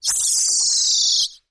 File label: Harsh Squealer